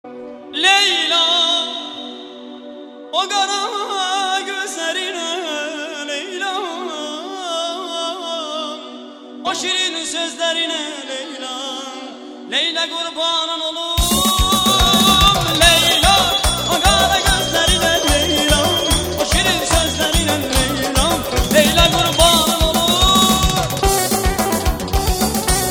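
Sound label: remix Turkish happy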